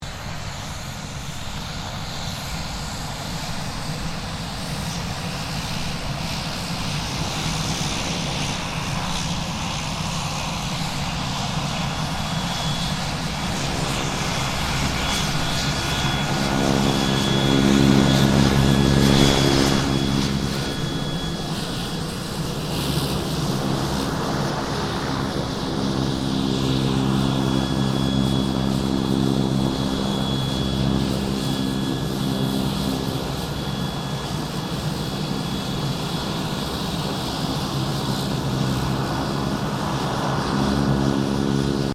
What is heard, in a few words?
AIRCRAFT PC-9A SQUADRON 5 TAXI; Roulettes air display; Airplanes; Vehicles